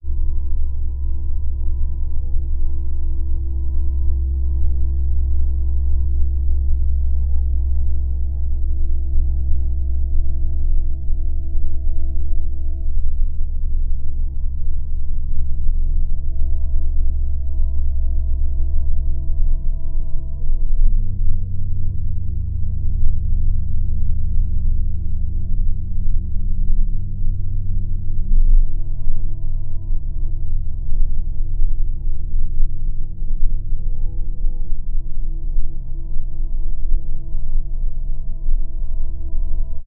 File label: sound design drone dark; sound effects one